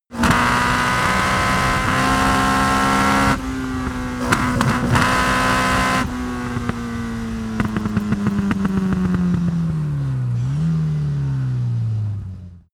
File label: car opel kadett short acceleration decelerate stop